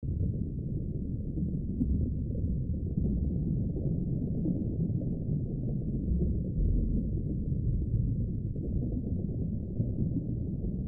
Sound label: Water Ambience; snes gamerip; marios mystery meat